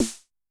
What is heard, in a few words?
Dance, Yamaha DTXpress-II snare